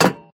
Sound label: footsteps metal Run